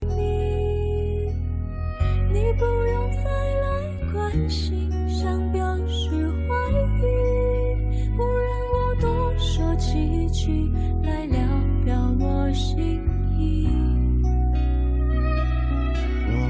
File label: singing